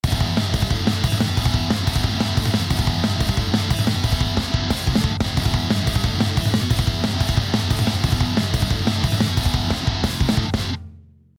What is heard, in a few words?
random metal snippet